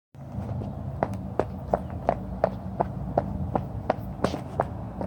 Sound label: Concrete